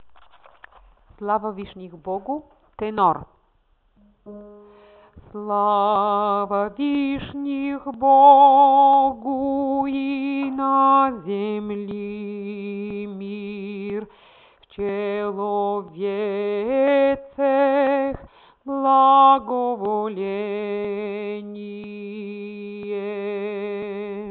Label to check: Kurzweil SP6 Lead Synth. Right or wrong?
Wrong